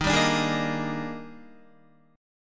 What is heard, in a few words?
Eb Major 11th, Guitar; EbM11; strummed; JGuitar